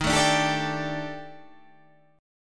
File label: D sharp Major 7th Flat 5th, down, chord